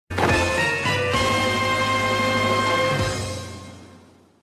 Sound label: get item; hyrule warriors; chest open